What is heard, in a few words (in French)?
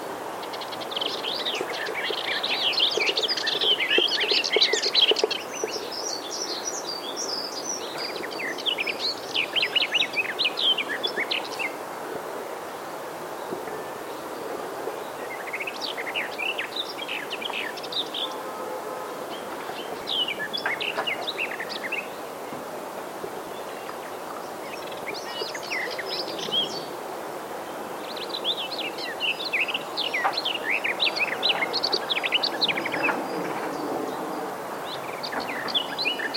hypolais fauvette jardin